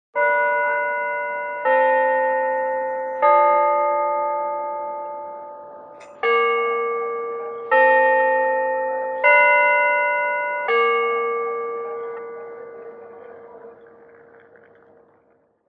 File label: Zoo Clock, bell towers exterior; Cent Park